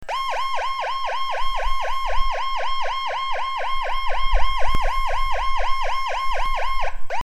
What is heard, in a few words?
Woop, siren; whelen